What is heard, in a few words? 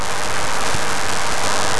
loop, techno atmos